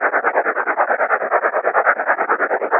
Boomerang Whoosing Loop

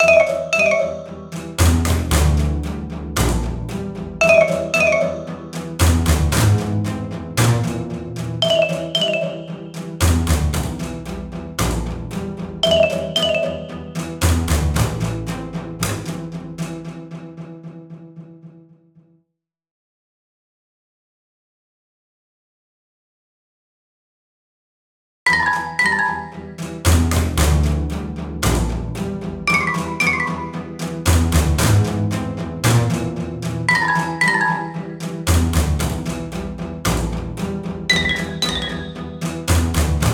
Cave Snap Xylo; Torchlight III; PS4

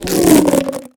water balloon, pull

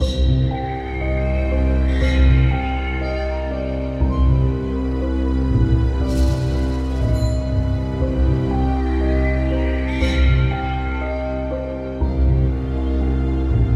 fantasy loop music - mysterious forest; The Hardest Dungeon

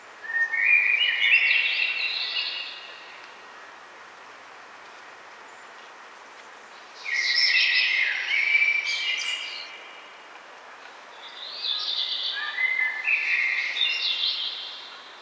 background noise forest; macos windows gamerip 2015; goblins and grottos

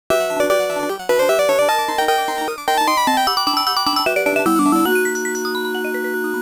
Game Start Music

